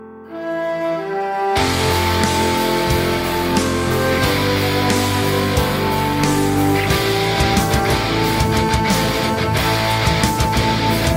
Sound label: Ringtone; Braveheart